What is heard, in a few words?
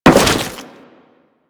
Crewman Step Goo, Footsteps; Warframe; Corpus